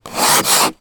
transportation ice scrape from car window external